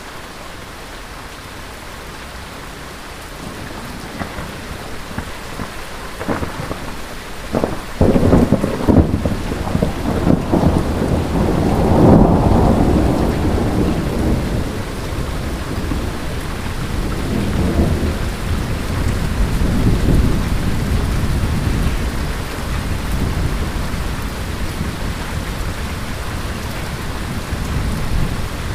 Thunder Heavy Rain

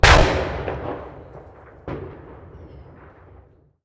Explode Bomb Close

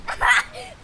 Child Laugh

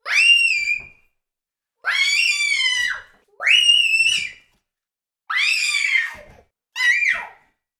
Child Joy Short Screams

